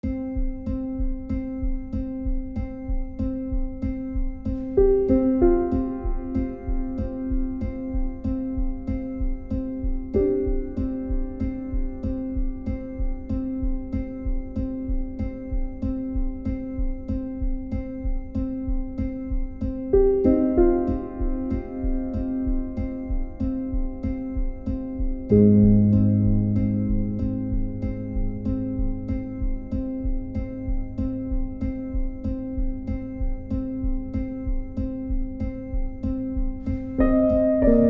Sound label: minimal motif